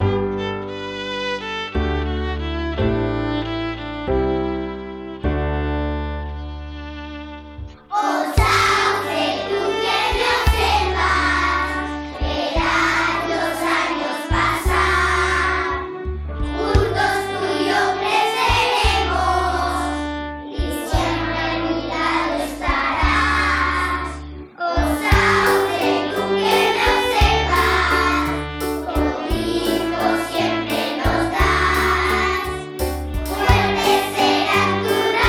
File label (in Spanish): voces